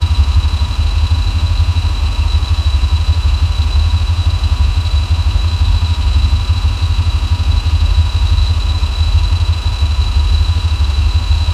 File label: realistic sound of a prop, buses; SFX Engine